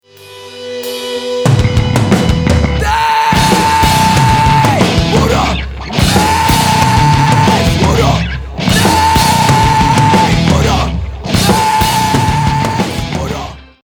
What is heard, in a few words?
Music